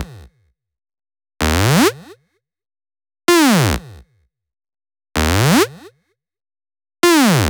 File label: Melody Kits 128BPM Root D#; Vengeance Samples